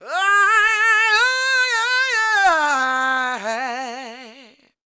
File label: SING, Vocal Planet Blues & Soul; CW SOUL